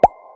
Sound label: sound click